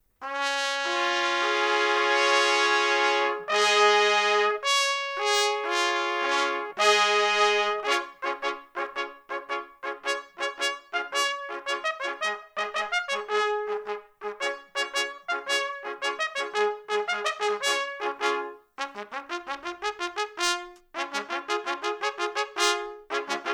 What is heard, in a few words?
Fanfare finale